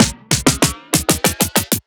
Fills 128BPM, Clubsounds

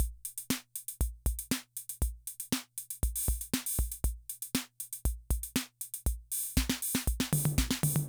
Loop, rhythm 55, classic machines

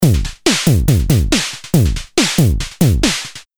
Loop, Drums; leloops

